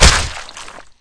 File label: body splat, violence; Benny Placeholders